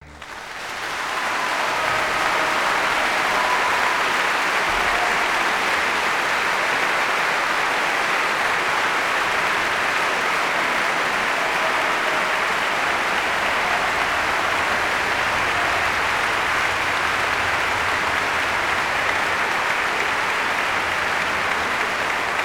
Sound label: Applause; End Brahms Symphony No2; Celibidache